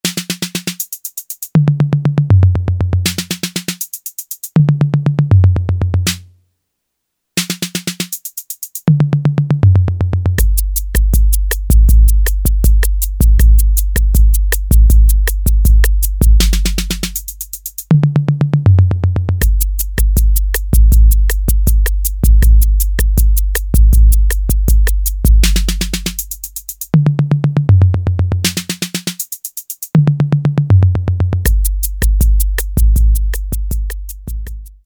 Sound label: Fill in, Roland TR-808; Factory